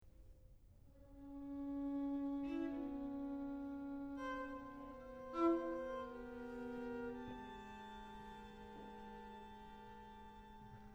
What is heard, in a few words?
Ensemble Music for Six